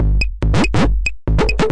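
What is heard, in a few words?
140 bpm, techno whip whup, drum loops and breaks; 131 to 140 bpm; samples-ghost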